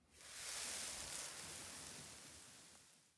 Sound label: Sand Gust